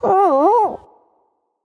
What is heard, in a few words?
growls